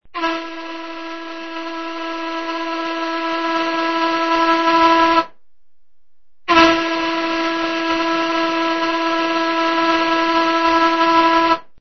barco

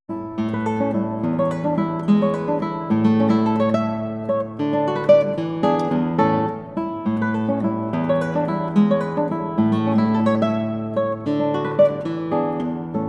Fantasía para Guitarra sola; Sors

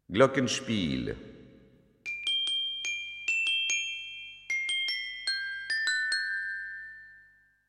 Carrillón

